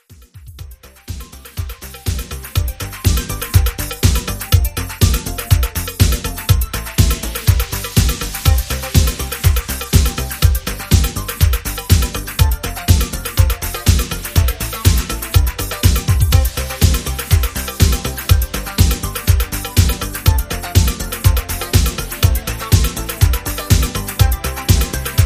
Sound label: 80s Redrum 122 Bpm